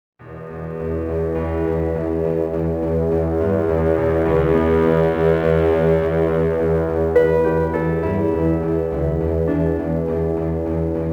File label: hospital subtle slow, music; Soldier Of Fortune II Double Helix; XBOX